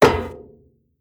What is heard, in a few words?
Metal Run